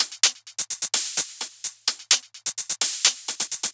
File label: Loops 128BPM, Drumloops; Vengeance - Essential Clubsounds Vol.5